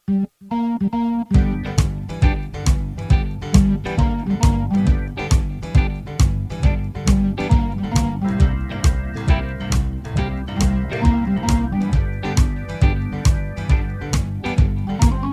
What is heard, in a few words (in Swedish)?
Signaturmelodi